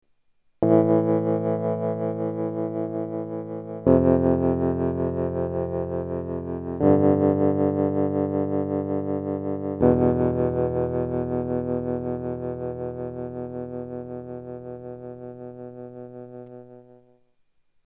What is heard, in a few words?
piano vibrato